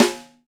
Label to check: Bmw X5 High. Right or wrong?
Wrong